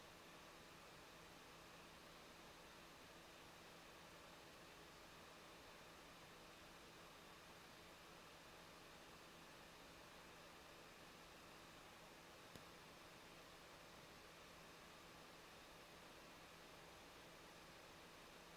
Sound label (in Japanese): 騒音録音